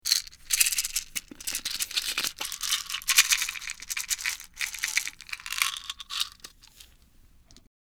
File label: Fumbling for pills